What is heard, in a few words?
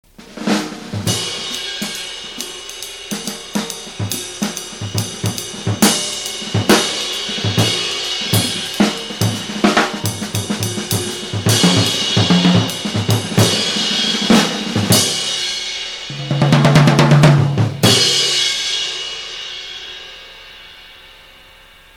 jazz